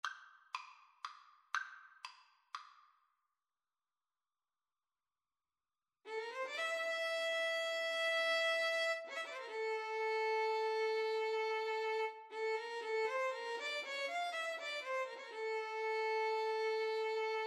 Bolero, Violin-Cello Duet

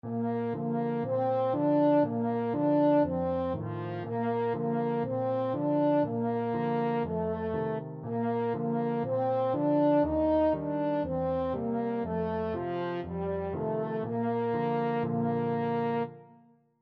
yankeedoodle, Yankee Doodle for French Horn

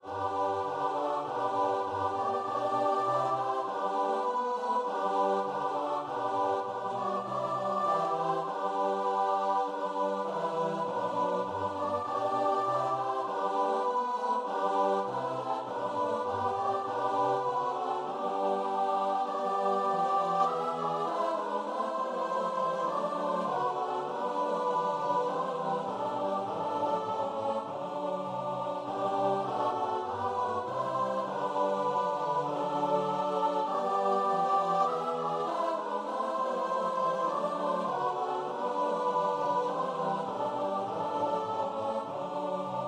choir

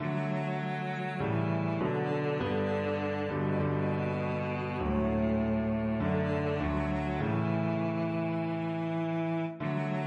cello

